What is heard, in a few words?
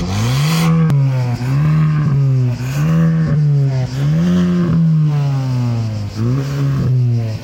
Sound Hyundai i20 N